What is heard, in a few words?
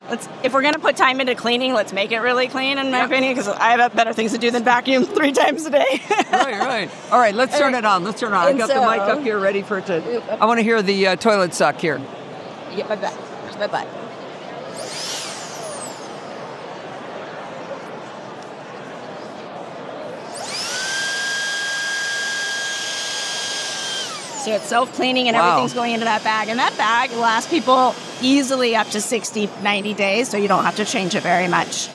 Levoit 6db (low) noise; auphonic noise filler words